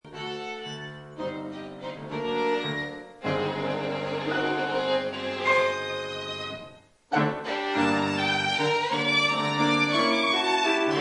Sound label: Concerto violin; Concerto Homanaxe; Manuel Quiroga; piano